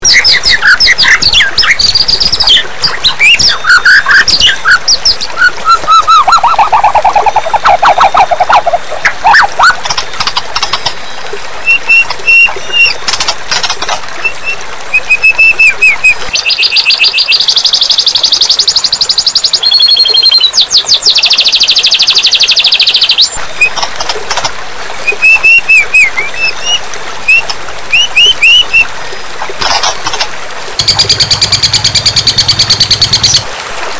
murai batu kenari tengkek

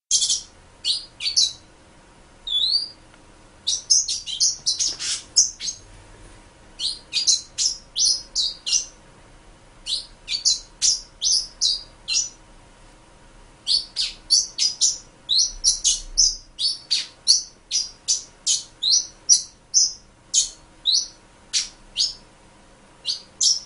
suara pintail wydah

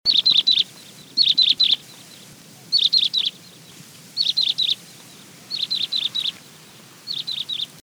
suara prenjak rawa

